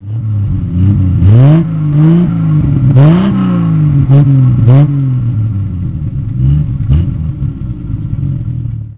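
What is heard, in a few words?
tr6 original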